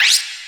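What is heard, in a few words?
CYMBAL LOOP; SFX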